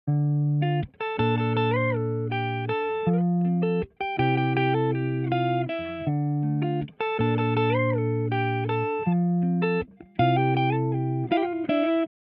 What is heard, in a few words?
Melody Warm